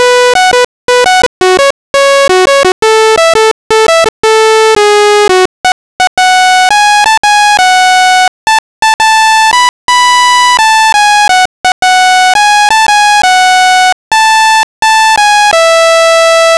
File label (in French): dechires basse voix